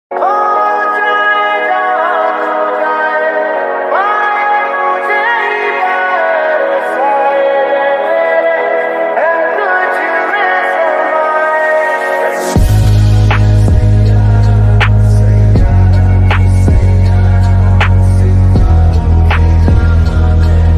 sad ringtone romantic ringtone love ringtone hindi